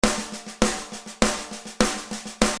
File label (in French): accents